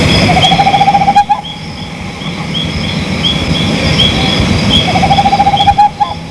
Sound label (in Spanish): LECHUZA